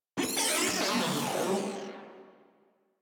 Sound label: Moa Vocal Death, Enemies; Warframe